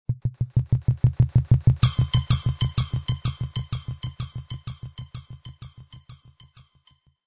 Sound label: beatbox